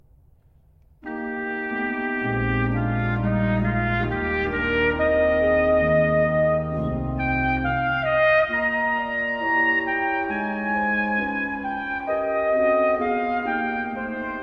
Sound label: Chorale Preludes; Sigfrid Karg-Elert; Three Chorale Preludes Op 65; Schmucke Dich O Liebe Seele